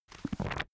book browse smooth

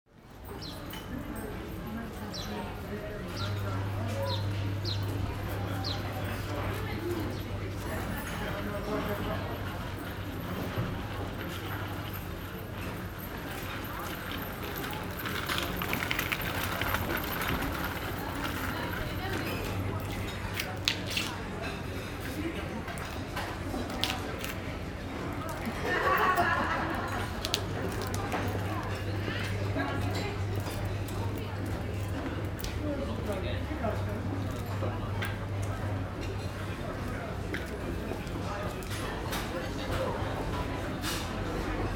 supermarket noise outside

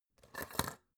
folder clamp flip

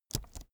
keyboard laptop space press slow soft

